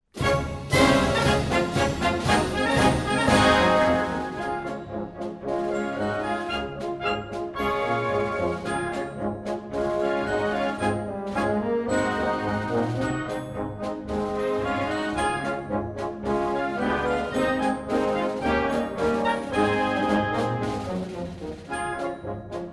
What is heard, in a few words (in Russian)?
marshevaya muz